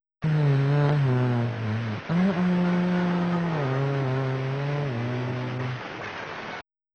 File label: hmm hmm hmm hmm hmm